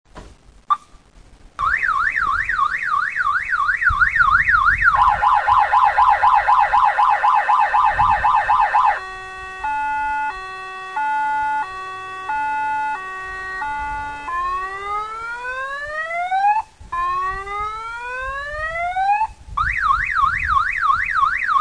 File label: car alarm ringtone